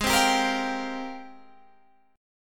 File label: G sharp Major 13th, Guitar, Standard; strummed; capo=3